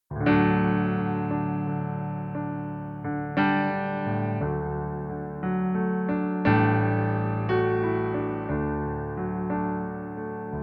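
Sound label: piano playback D major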